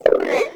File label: Wyrm Idle; Warframe; Characters; Pets